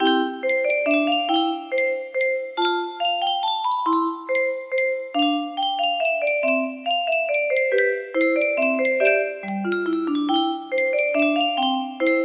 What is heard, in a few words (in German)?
menuett g dur; js bach